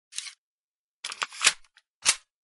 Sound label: reload